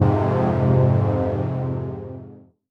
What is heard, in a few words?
Fm Dub Chord; Electronisounds; Enigma Dub Cube One Shots